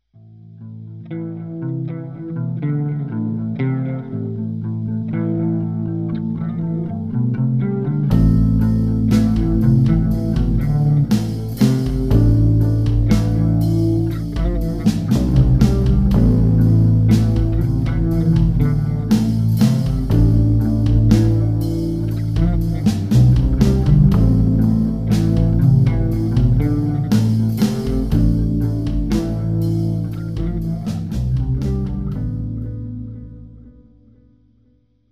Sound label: bass solo